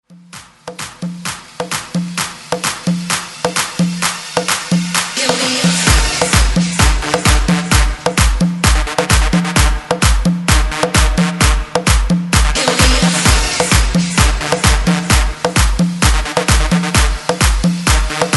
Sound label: 130Bpm BREAKMIX